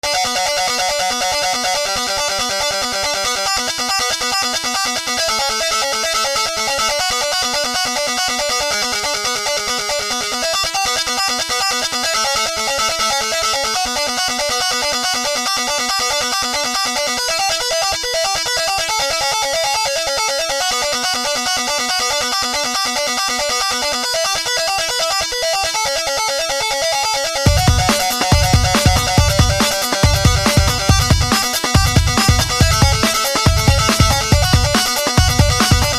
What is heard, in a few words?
(Guitar Solo Cover)